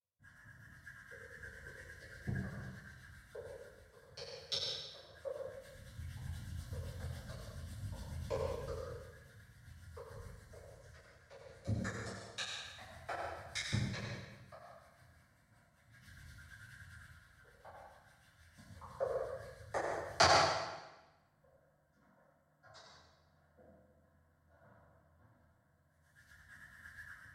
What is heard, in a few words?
hall chamber